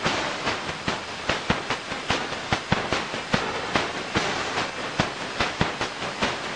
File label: dirty splashing songbounce, drum loops and breaks; 141 to 160 bpm